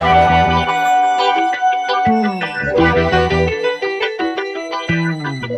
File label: keys stuck; Loops; Phonky